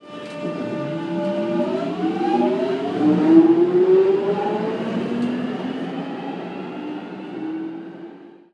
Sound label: 1996 stock; multithunderstock; simutrans; Pak128.Britain-Ex